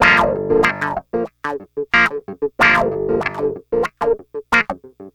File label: Clav Drive Rhythm Gm 93 BPM